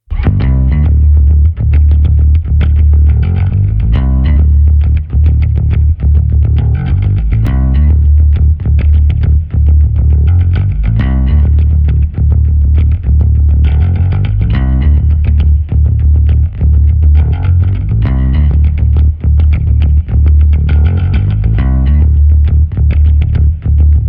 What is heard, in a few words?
Layer 136bpm Loop